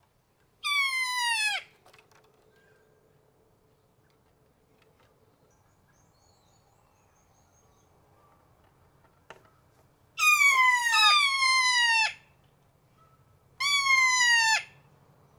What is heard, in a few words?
Kea Calling